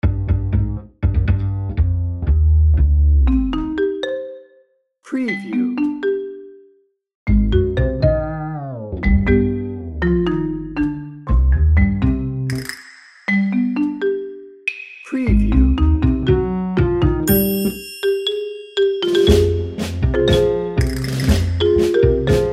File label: tango mischief lite